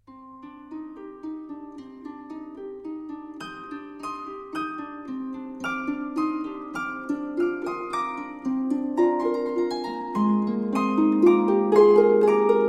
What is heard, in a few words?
Oriental sample, harp